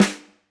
Snr, drum

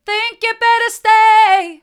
think you better stay hard, vocal

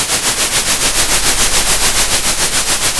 center, Sound of a train

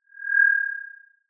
Sound of an owl

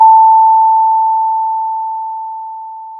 pop, Sound of a school bell

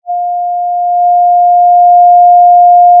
pop, Sound of a cruise ship horn

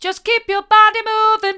just keep your body moving hard, vocal

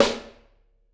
Piccolo D, drum

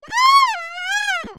anger peak; VIVAE; vocaltrax

